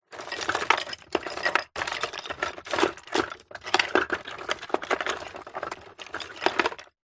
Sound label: drawer wooden full of loose bits and pieces rummage